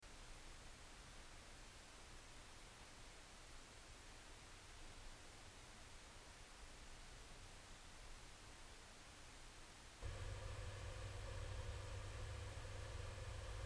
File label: seagate pipeline 500gb 1m